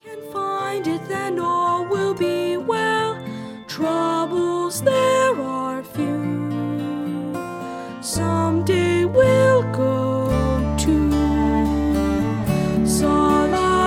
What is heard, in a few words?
Vocals